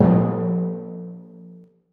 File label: timpani f a1; Percussion; Sonatina Symphonic Orchestra